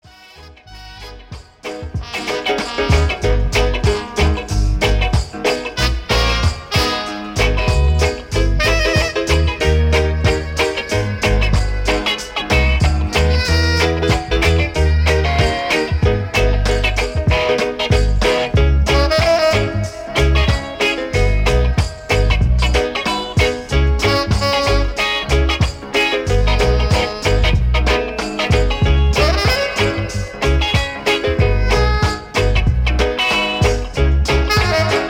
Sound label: instrumental